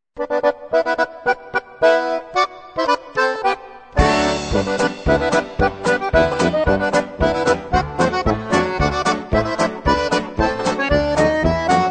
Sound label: GERMAN HIGHWAY POLKA